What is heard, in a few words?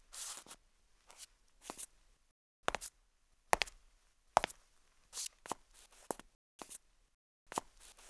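footsteps; Mafie 1 cz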